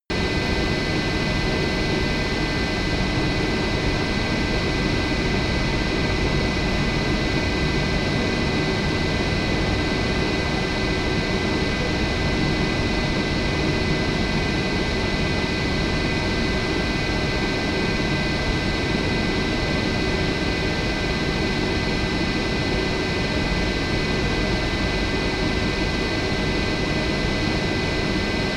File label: train intercity train locomotive idle